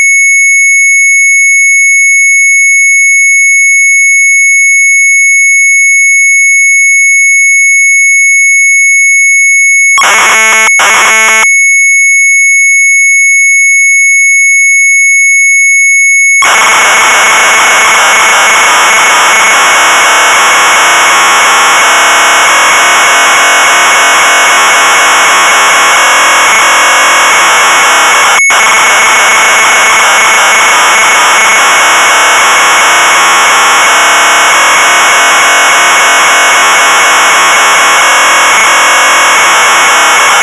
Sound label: MZ800 Tape, sharp; PIANOSPELEN; retromelsarchive